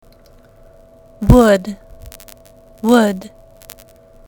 wood

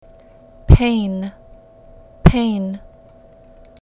pain

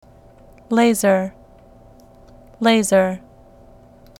laser

